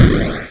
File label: explosion; DelphiArcadeGames; AlienInvasionStarter